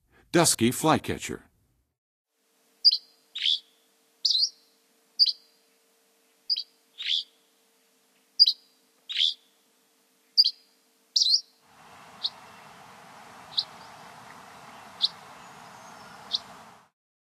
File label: Dusky Flycatcher; N. America; Western USA Songbirds; Songs of Birds of North America